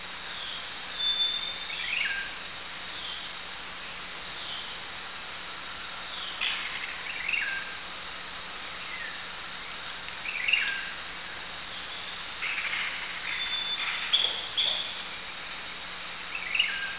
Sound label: jungle ambience